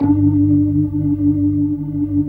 G1, Keyboards Old School; USB Soundscan vol.09; AKAI; BIG HAMM

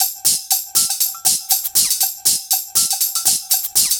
DRM, House loops 120; Best Service ProSamples vol.10 - House; AKAI

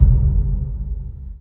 KICK FX, 3-D Percussives; E-MU Producer Series Vol. 5 – 3-D Audio Collection; P.A. Kit

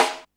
SD, Dance Mega Drums; Best Service; SD-HIP

